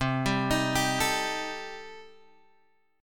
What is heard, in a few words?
C Minor 6th C Guitar Open F; Cm6 arpeggiated; 12,14,15,14,12,x